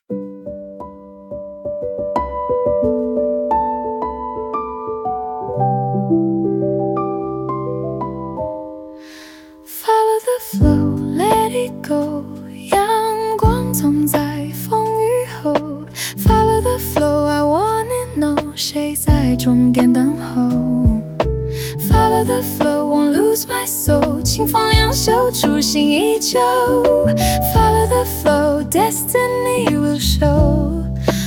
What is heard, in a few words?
piano